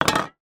household deodorant can fall over on wood table; zapsplat

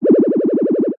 science fiction tractor beam laser short

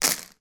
household matches handful drop on surface

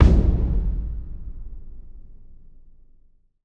Impact Drum Hit; bottlerocket

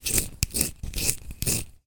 office pencil sharpener manual; zapsplat

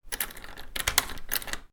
household door key lock pvc conservatory

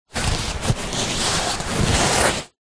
sport exercise mat foam thin rolled up handling

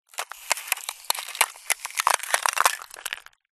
nature ice crack under foot

